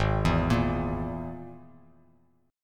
G sharp Suspended 2nd Sharp 5th, Bass Standard; G#sus2#5; arpeggiated; JGuitar

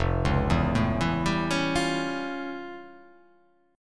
F Augmented Major 9th F

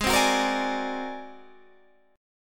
G sharp 9th Flat 5th G sharp; G#9b5 strummed; JGuitar

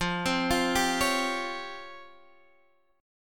Db Major Sharp 11th F

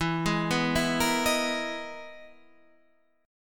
E Major Sharp 11th E, Guitar, Standard; EM#11 arpeggiated; 12,11,9,9,11,11; JGuitar

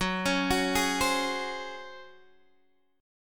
Db Major 7th Suspended 4th Gb

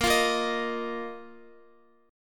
Bb Suspended 4th Bb down Guitar; Bbsus4 strummed

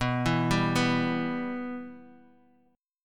B Suspended 4th Sharp 5th B Guitar Open D, chord

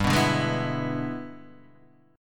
E 7th G, guitar; E7/G strummed; JGuitar

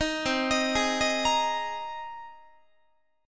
C Minor 7th Sharp 5th C Guitar

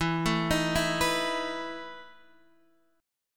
E Major 7th Suspended 4th E down Guitar DADGAD

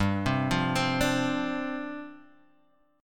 G 7th Suspended 4th G down Guitar Open F; Listen to G7sus4 arpeggiated; G7sus4 Chord

